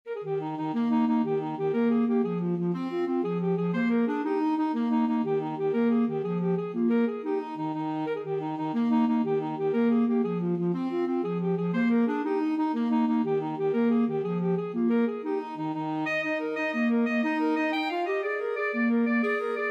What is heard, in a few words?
irish jig medley, clarinet saxophone duet